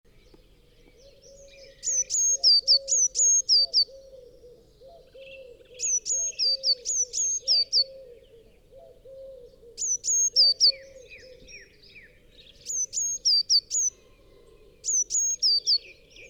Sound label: Cyanistes caeruleus; SETE